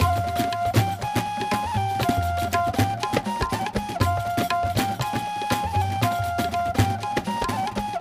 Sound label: percs; music world